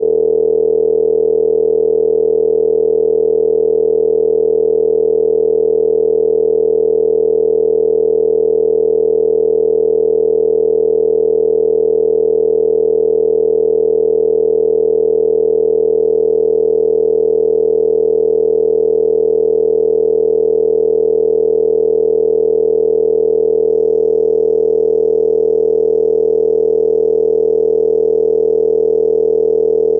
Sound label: gamma spark 40hz isochronic soft; meditations